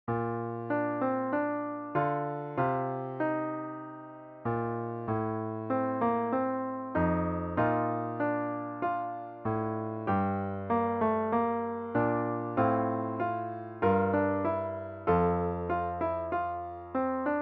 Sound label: Chorale Prelude; birthday